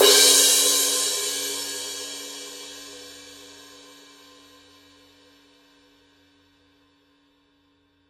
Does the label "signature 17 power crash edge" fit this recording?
Yes